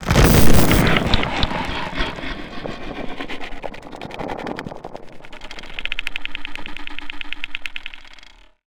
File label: organic texture, creature; Bluezone Corporation